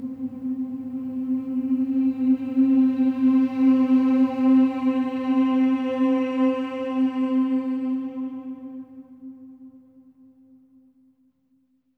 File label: MM C4; SWELL MMS